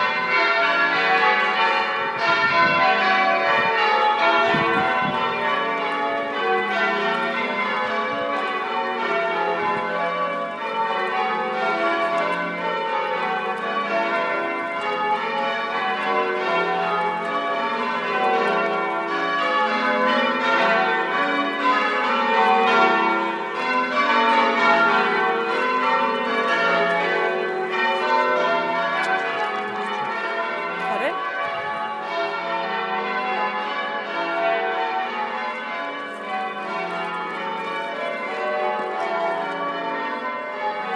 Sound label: Nantwich church bells